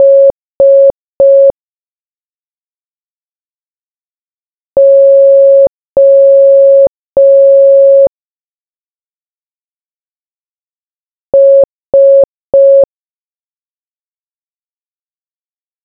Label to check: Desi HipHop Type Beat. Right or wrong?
Wrong